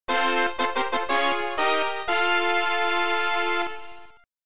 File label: fanfare